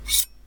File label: Skewer